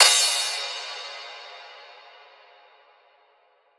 cymbal dirty; Drum One Shots; SD MIDNIGHT Sample Pack; Slate; Cymbals

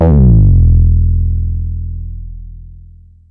Synth oneshot Slide Down E, Synth Bass; Slate Digital BASSHOLE Sample Pack

